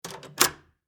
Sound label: Foley Door Hotel Lock Interior; Miscellaneous Door Sound Effects; Miscellaneous Doors (Sound Effects:Doors)